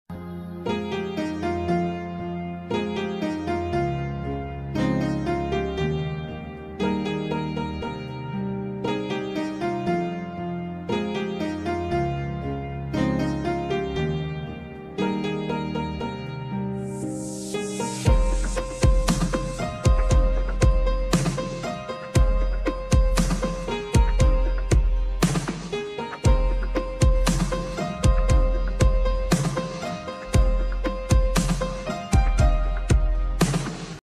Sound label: song bgm ringtone; Siren movie